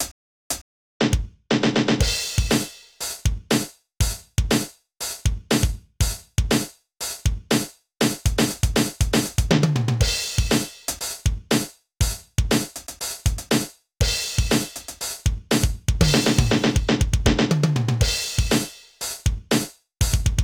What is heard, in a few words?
Drum Tutorial; Cory in the House; NDS